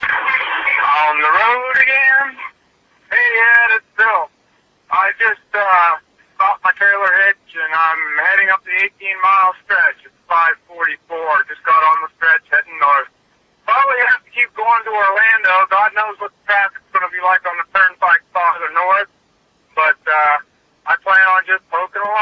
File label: Hurricane; Wilma